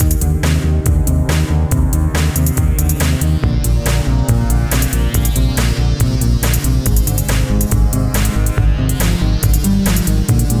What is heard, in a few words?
ending 140bpm